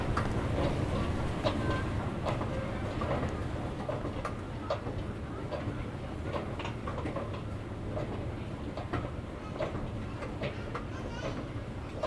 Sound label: Escalator Sound